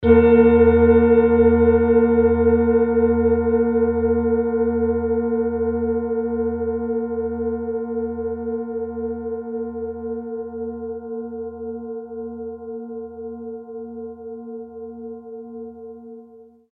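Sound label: tibetan bowl; moonset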